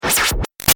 Shot Laser Pump Delay; Sound Design; Lasers

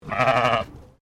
Animal Sheep English Leicester Baa; Sheep at Collingwood Children's Farm; Sheep (Sound Effects:Animals)

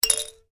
toy musical shaker; Miscellaneous Toy; Toys; McKinney Sound

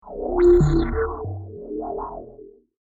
Alien Impulse Ambience; Alien Sound Effects; science fiction sound effect

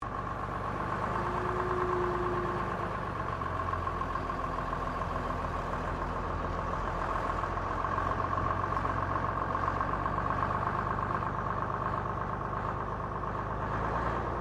BUS DOUBLE DECKER BRISTOL VR 1971 DRIVE SLOWLY; Bellarine Tourist railway vehicles; Busses

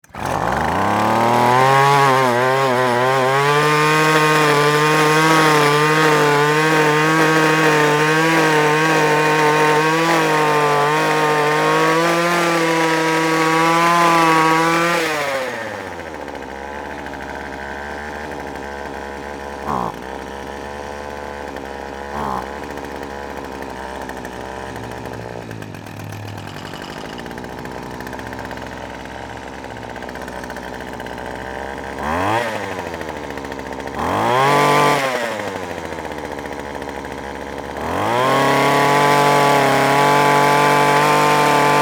Weed Wacker Run, Pull, Start, Rev, Off; Household; Weed Whacker; BlastWave

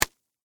Stab Apple Hit, Texture, Stab, Apple, Hit, Fruit; BlastWave

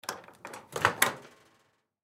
Microwave Door, Microwave Door Open; Appliance; Microwave Doors; Household; Blastwave

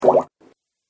Goop Sound, cartoon; Cartoon Sound Design Sound Effects

